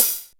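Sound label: medium closed; TECHNO RAVE; Pro-Rec - Synthsonic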